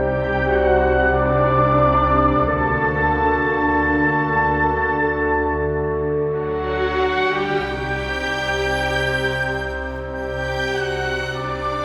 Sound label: Water Tank Cue; Tau; Tau Quest Cues